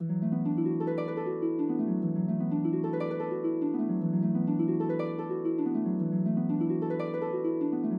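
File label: Harp arpeggio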